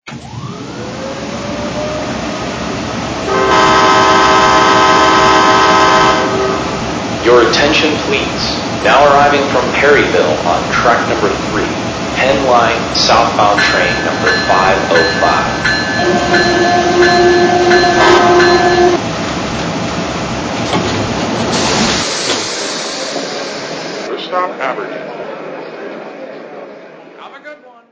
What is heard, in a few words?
AEM-7, trains